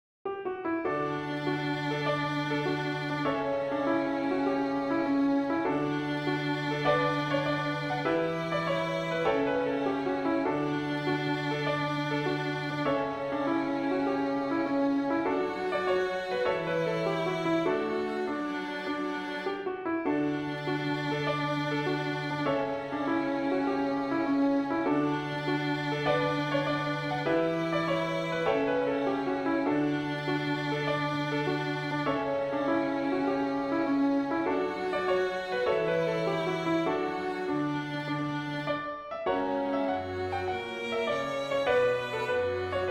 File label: hornpipe; Galway